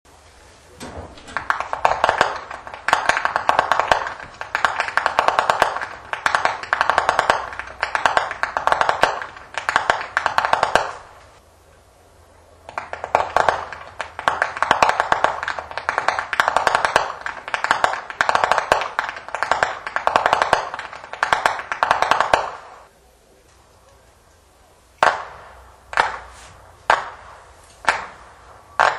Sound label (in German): schnalzen, flachgau; maxglan